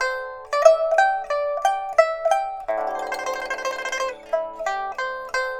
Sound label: PIPA, 90; INIS - Opium